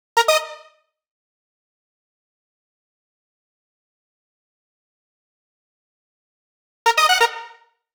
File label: 130 D#m synths, Complex Electro Synths; Prime Loops; Bias